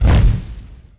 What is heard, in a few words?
stone hit stone lg; objects; Star Wars Obi-Wan; XBOX